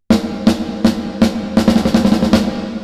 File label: Reverb Snare Rooms; Cinematic Rooms Professional